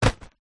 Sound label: tent in bag drop concrete; foley; zapsplat